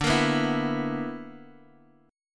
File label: B Minor Major 7th Double Flat 5th, chord